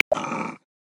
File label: Tasmanian Devil, Australia; Xiaomi Redmi-Note-8-Pro